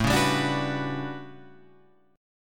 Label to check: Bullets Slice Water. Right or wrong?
Wrong